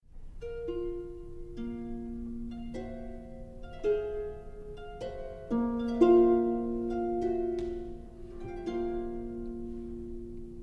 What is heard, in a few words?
Trio Music Second Movement